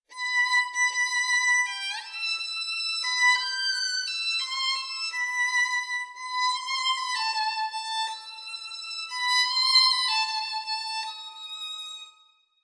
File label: performer live ensemble